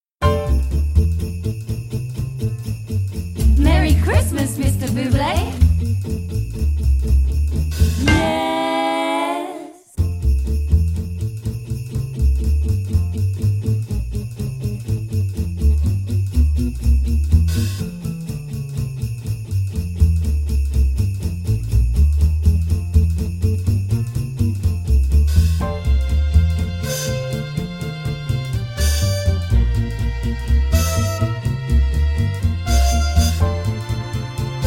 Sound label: Jingle Bells